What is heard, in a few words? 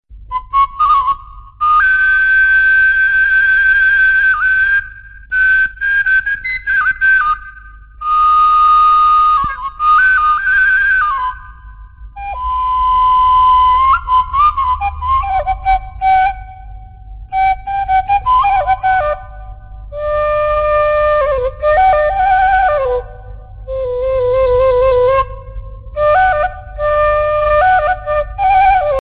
seruling sunda